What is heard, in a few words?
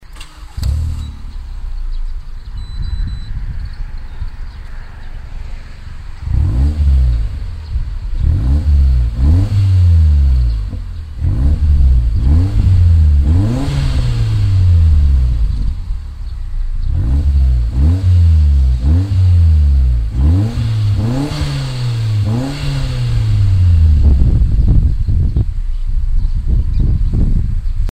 exy10 turbo, muffler; car; beer